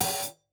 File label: hat open close